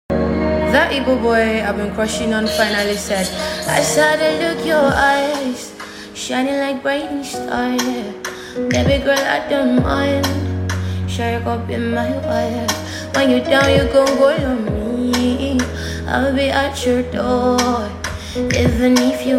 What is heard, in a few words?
Female Version